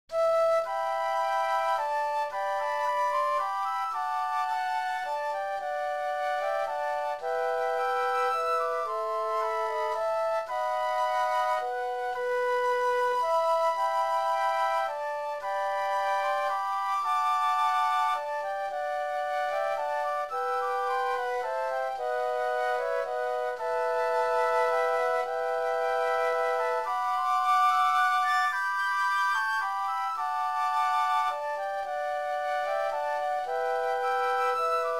Flutes

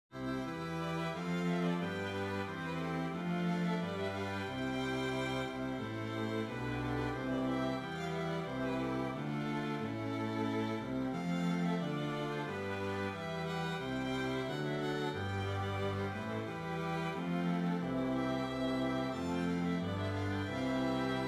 Hymn Strings

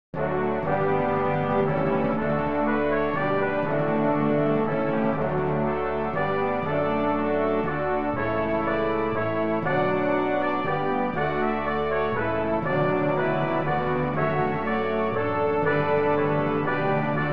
Hymn

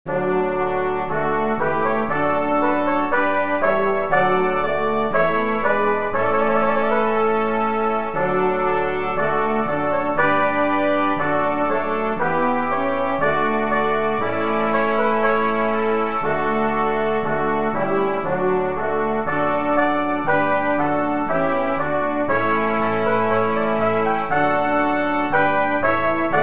Hymn

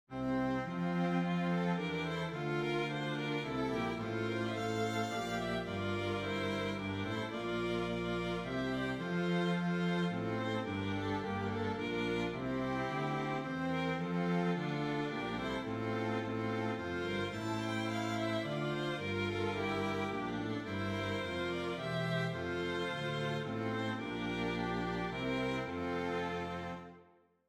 Hymn Strings; Hymn 101

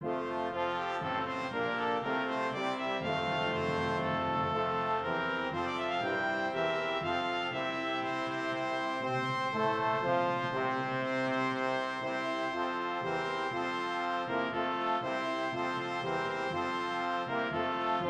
Brass

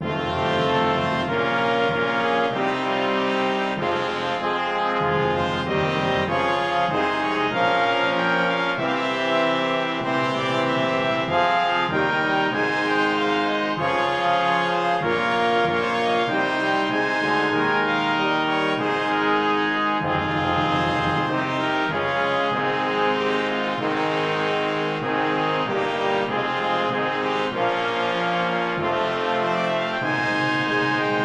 Hymn BRASS

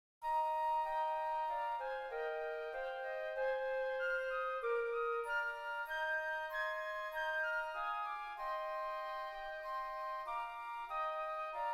Hymn 2 Flutes

